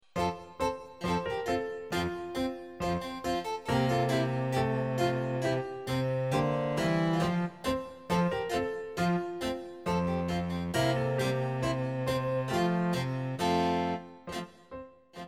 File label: Musette In F